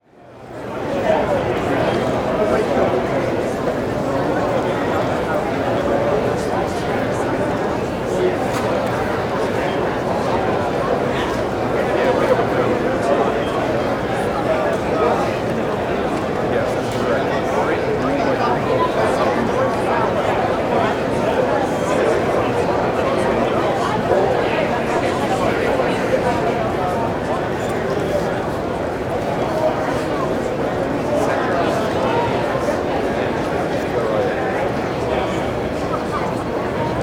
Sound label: Crowd Indoor Dense Hall, Walla; Sound Ideas; Walla Series